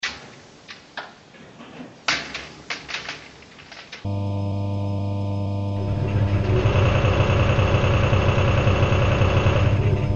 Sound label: CHS4